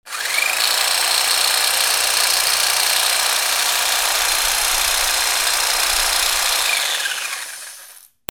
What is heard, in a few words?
GARAGE